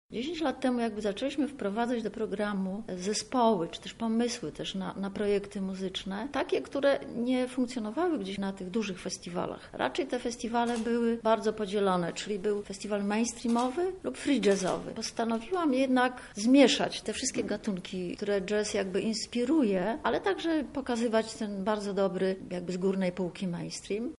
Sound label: jazz; 10 edycja jazz festiwalu